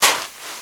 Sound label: STEPS Sand, Walk; High Quality Footsteps